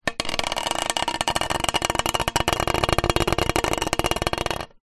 small metal pole scrape on cobbled concrete ground; foley